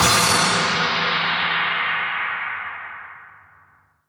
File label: Downlifter